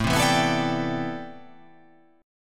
A Minor 11th A, Chord

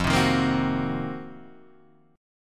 C Minor 7th Flat 5th F, chord; Cm7b5/F strummed; JGuitar

